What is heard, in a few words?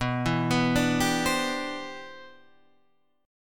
G 6th Add 11th B, chord; G6add11/B Chord {7 7 9 7 8 8} arpeggiated; 6add11:1,3,(5),6,11